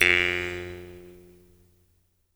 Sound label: jaw harp; E-MU Producer Series Vol. 7 – Old World Instruments